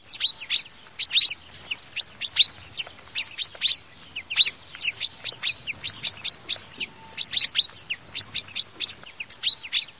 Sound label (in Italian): fringuelli frosoni